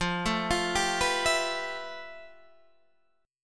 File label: F Major Sharp 11th, Guitar, Drop D